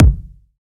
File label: kick lofi